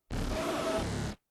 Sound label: video static distortion short bursts